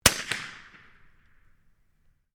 RIFLE CZ 452 VARMINT 22 CALIBRE FIRING; A selection of domesttic firearms; Rifles; Guns

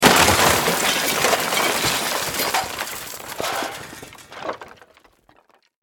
Impact Crash Debris; Crashes; Objects; SFX Bible

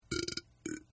Burp; Burping; Human

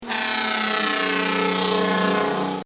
Firetruck With Siren And Horn; Electronics; Sirens; Bobby Cole

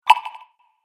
Fantasy Game Collect Sound, video game

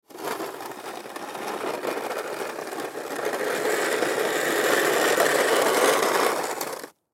Snow Shovel Metal Scrape Hard; Snow Shovel Metal Scrape Hard Long; Yard Tools (Sound Effects:Tools); Blastwave; Weather

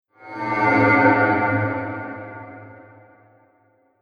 Ghost, horror sound effect

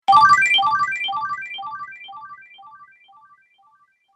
Health Powerup, video game

